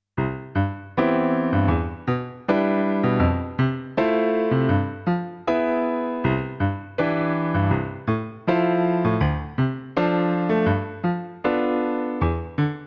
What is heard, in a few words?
karaoke